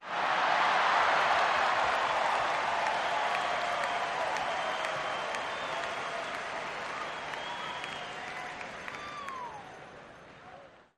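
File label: Crowd 50000 Whistle, large int; Crowd ( 50, 000 ): with Whistles / Applause, Surge / Noise-maker In Background. Cheers Crowd, Huge.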